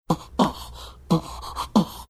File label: beatboxing